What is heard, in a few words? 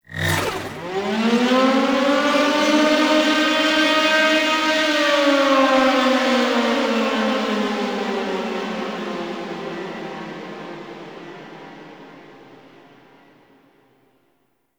Defense Start Waves; Missions; Grineer